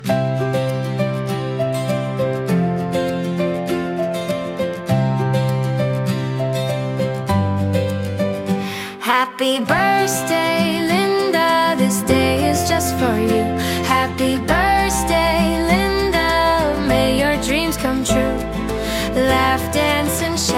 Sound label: Happy Birthday Song